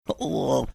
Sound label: making disgusted sound